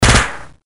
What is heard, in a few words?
damage; patcheconwarsdx